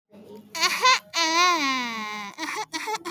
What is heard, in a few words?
eha ehh